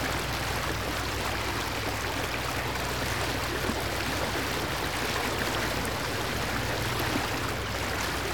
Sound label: water river; ott3d